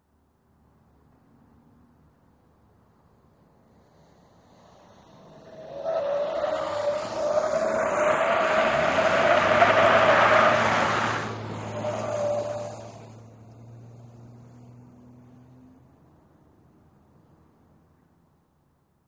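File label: SUV Truck Accel Corners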